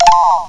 trill

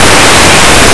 book; Blue Heat

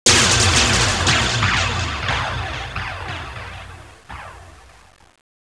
HIT

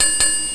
Bong

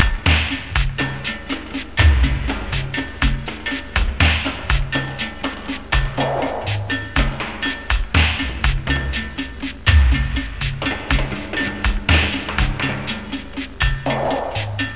conga

